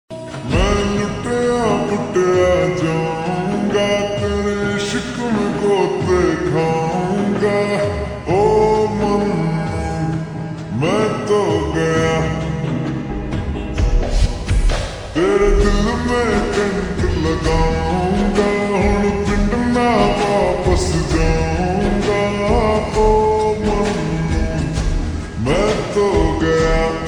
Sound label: Ringtone slowed and reverb